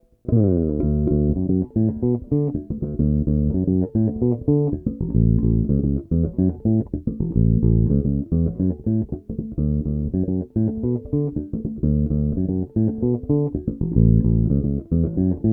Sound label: Jazz bridge rolloff